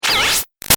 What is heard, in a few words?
Shot Laser Coarse Pop Out; Sound Design; Lasers